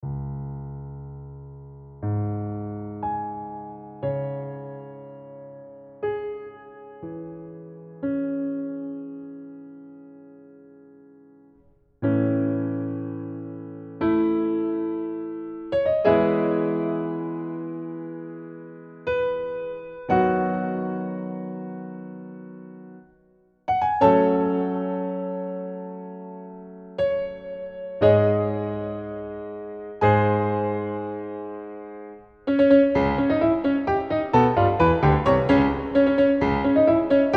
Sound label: Piano Track